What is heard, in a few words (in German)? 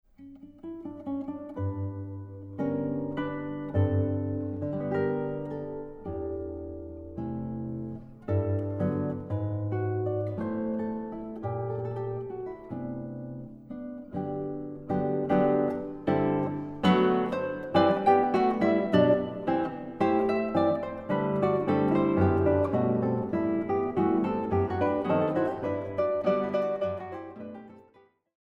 Gitarren Duo